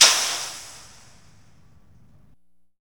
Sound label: thrash metal; SFX